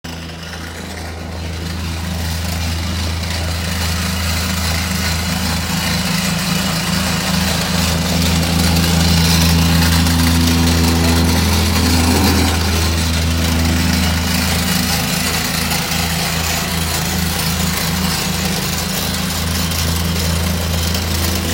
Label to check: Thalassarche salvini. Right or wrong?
Wrong